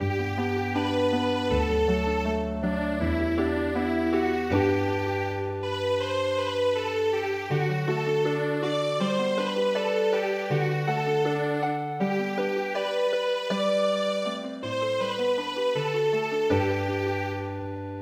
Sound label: German Healing Harp; midi; traditional